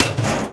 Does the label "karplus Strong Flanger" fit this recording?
No